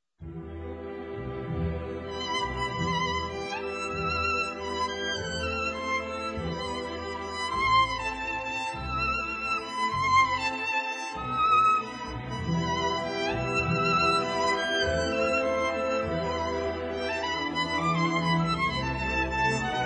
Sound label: violin; western